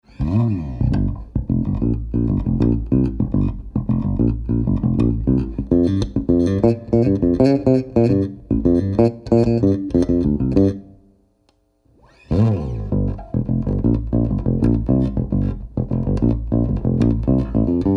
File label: SAMPLE BASS ONLY; warwick